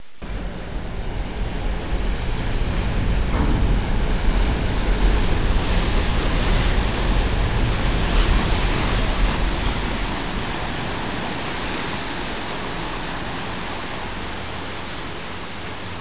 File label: background